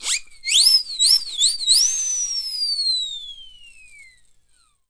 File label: WHSLSIRE, Synclavier Percussion World & Orchestral; ILIO; Synclavier Percussion 2